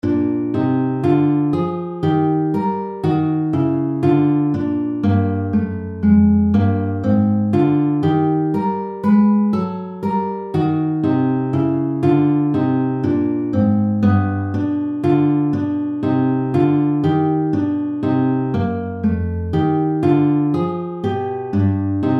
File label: Intervalic Blues in Bb